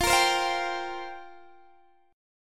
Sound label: F Suspended 2nd Suspended 4th, Guitar DADGAD